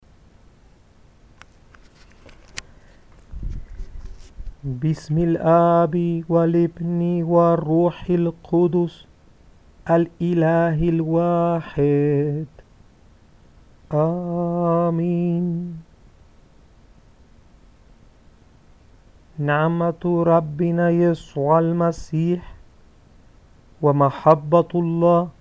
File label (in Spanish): Ritos Introductorios Cantados en Ar